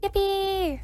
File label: yippee